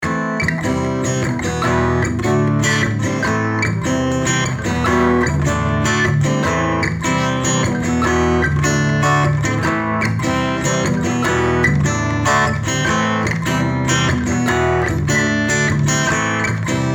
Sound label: Am C Em D 150bpm